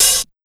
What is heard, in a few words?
PHASE 130 HHO, Big Beat Drumloops; USB Soundscan vol.25; AKAI